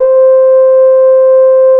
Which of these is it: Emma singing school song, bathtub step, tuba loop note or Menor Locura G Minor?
tuba loop note